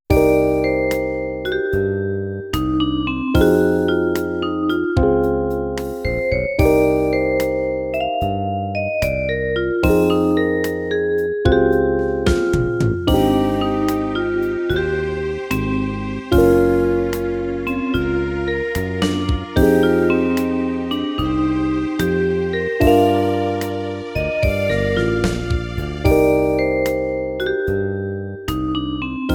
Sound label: SYNTHE; kenshuui tend dokuta original soundtrack